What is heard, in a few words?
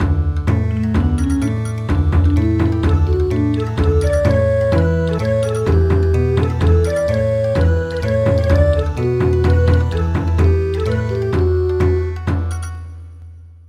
Bon Odori